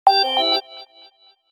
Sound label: Pure Tone alert; Motorola Droid-Pro